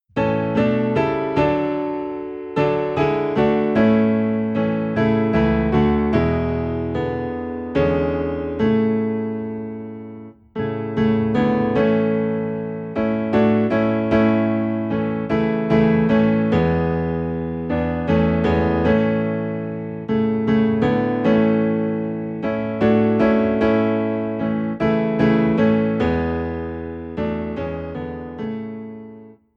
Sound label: Piano Low in A, hymns; Hi D Lo A; The Love of God